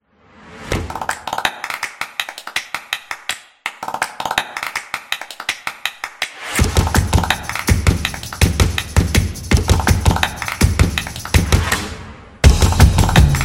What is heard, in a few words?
Clap Percussion; percussive nation vol 4